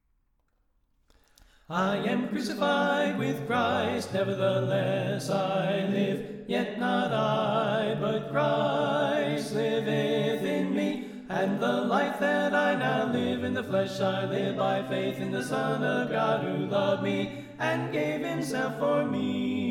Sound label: Tenor Left